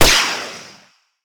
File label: Freezer Gun Shot; Lagoon